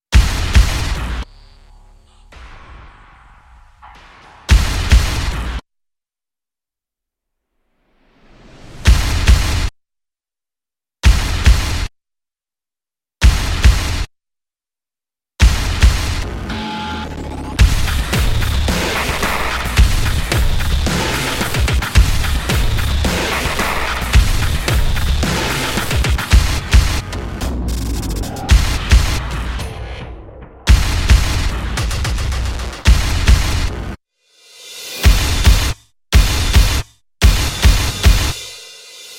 drums

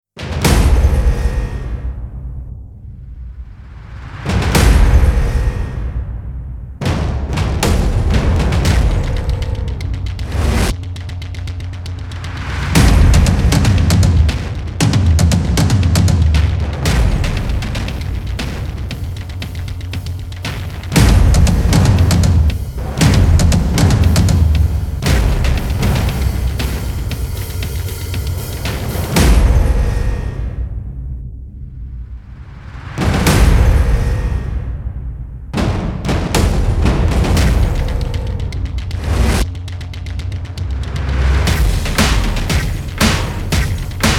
drums only

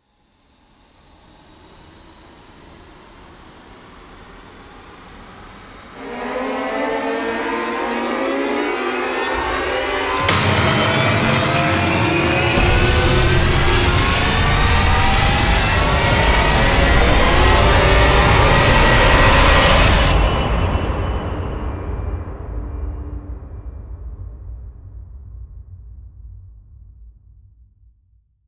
rise

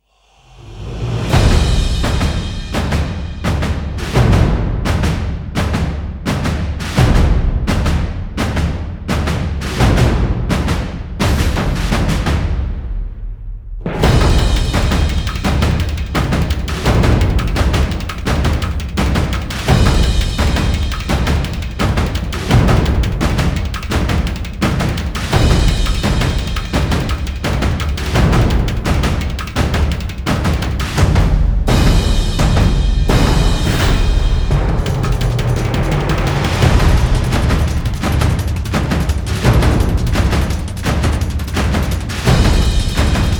drums build

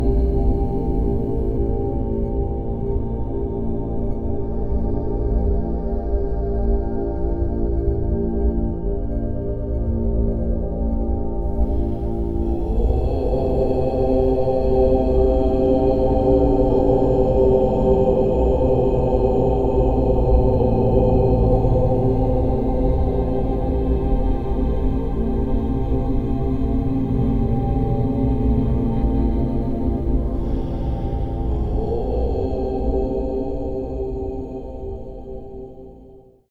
monks chanting om

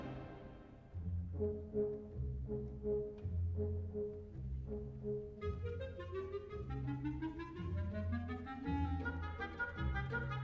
waltz, filarmonicheskii orkestr

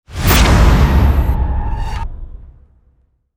hit big

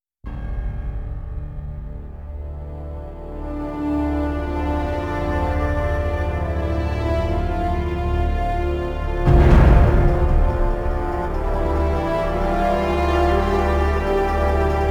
non choir

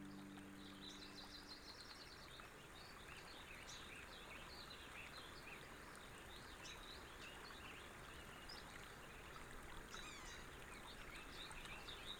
nature soundscape

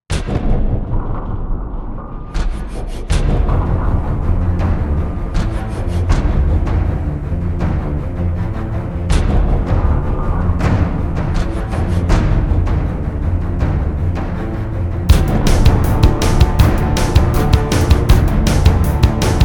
no choir rock

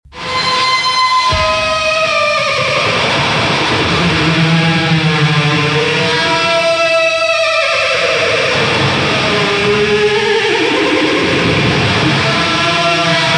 guitar solo

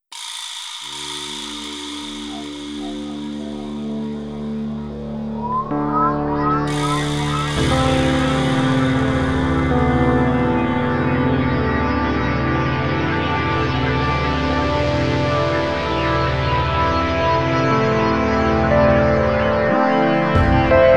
drum and bass mix

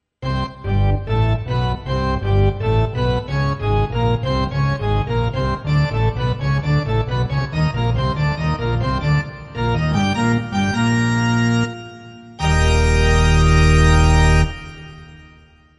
church organ baseball charge

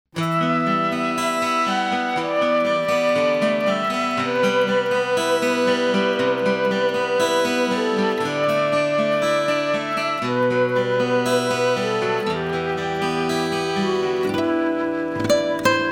acoustic version

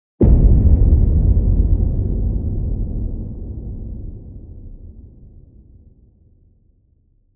hit sub; Ninja Tracks; undertow

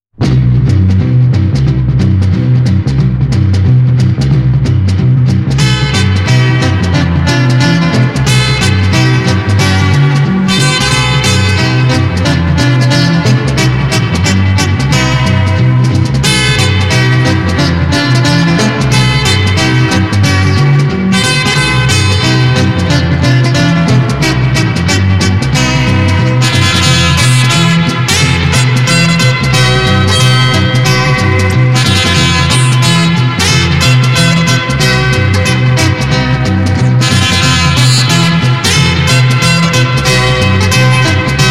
galloping guitars

